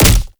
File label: Fulmin Prime Punch, Weapons; Warframe